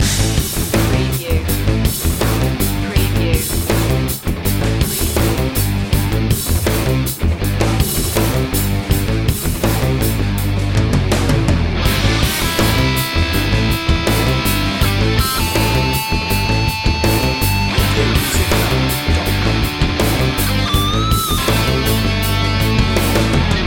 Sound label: slam dunk loop